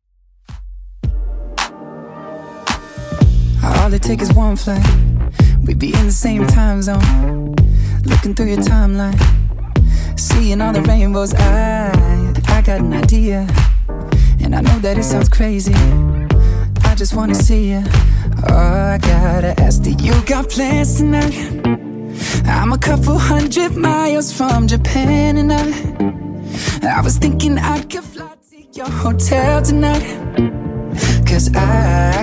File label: Redrum 110bpm